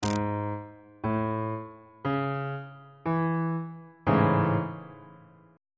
b double flat major 7th 3rd inversion on bass clef